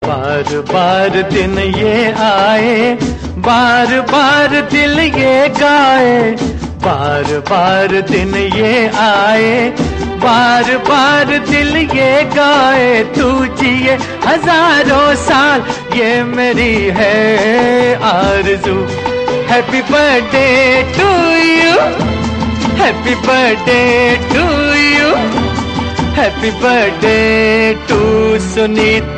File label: happy birthday song